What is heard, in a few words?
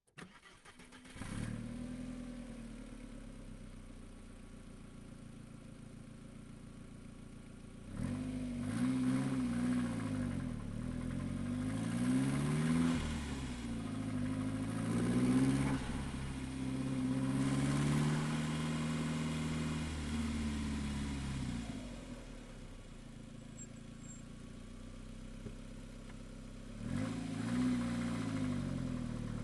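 toyota endurance race car onboard slow start drive stop reverse stop drive stop engine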